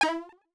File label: bumper; big tower tiny square